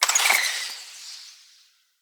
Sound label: Bow Arrow Projectile Start; Sinecure